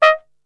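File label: D#3, flugelhorn, brass; Best Service; Brass Super Section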